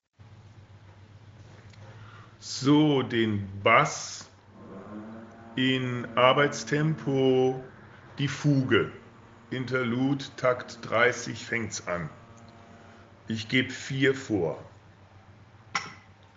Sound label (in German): Engel Bass uebetempo; Uebedateien